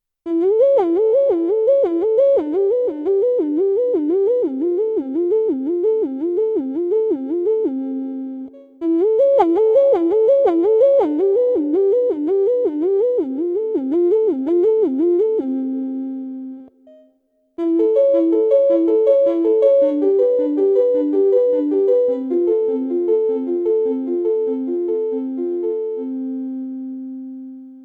Striso board Portamento Impro externer Hall

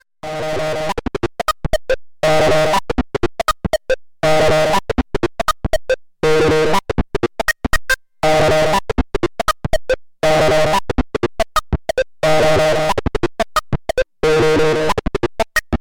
Novation Circuit Mono Station Swing Sync 16tel vs 4tel

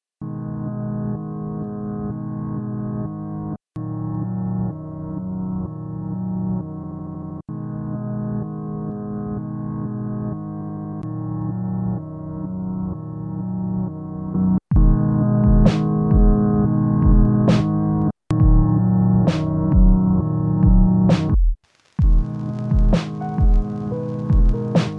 Roland SP 404Mk2 Vinyl und Cassetten Simulation

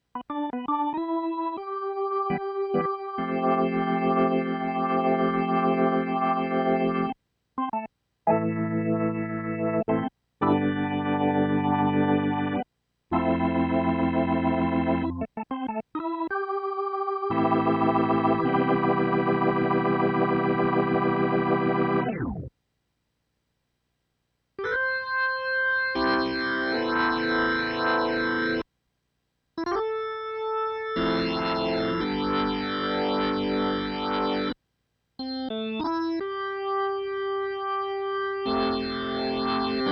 Orgel

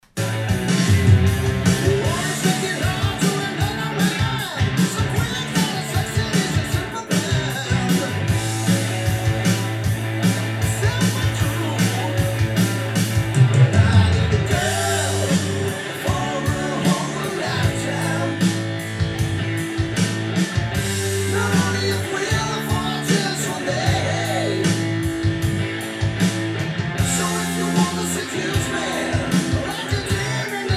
XY Musik Anlage ohne Windschutz; Centrance MixerFace R4B

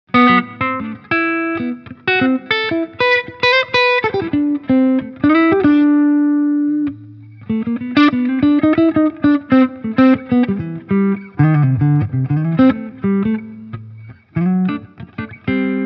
PRS DGT Hals HB Clean; PRS David Grissom DGT

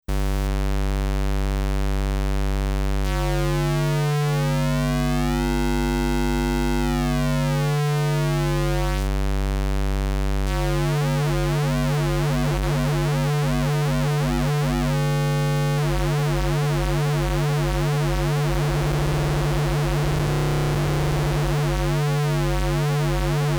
Tone Mod pur dann Unison

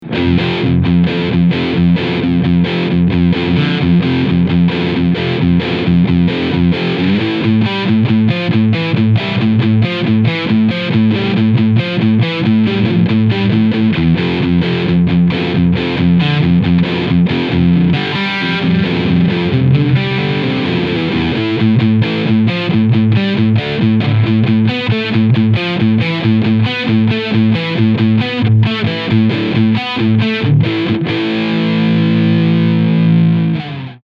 Orange Rocker 15 Terror Treble FULL Mid 9 UHR Bass FULL Full Gain

